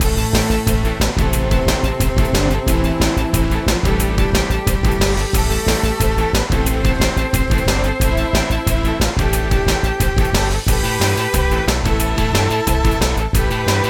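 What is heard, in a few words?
Strong Opponent, game music; princess maker 5; ps2 psp windows gamerip 2007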